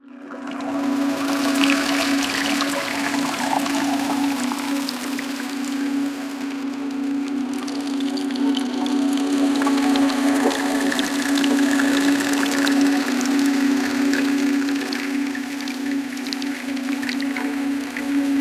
a sound effect of oars

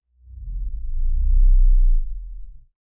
a short 2 3 second intro, finance

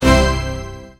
epic and satisfying victo, orchestral hit

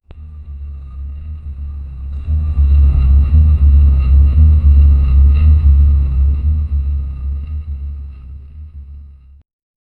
low stone rumble

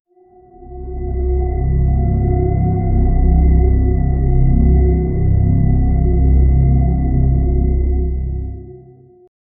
ominous horror movie ambi

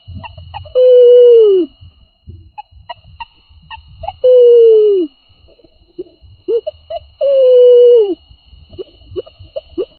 a cute baby monkey crying

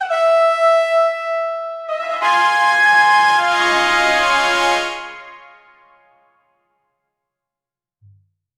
success fanfare trumpets

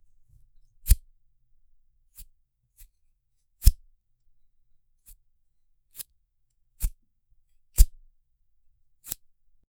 undo a tie knot, fabrics

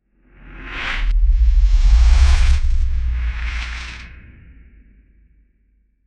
gentle ethereal cinematic, transition

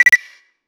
usp, gun

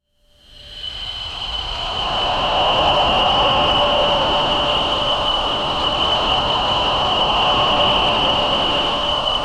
dark whispers ambient

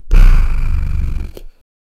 deep guttural demonic gro; bassy

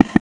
dull thud sound unsuccess, failure

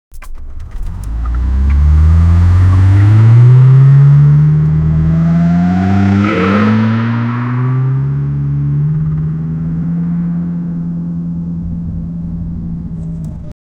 sport car speeding up; acceleration

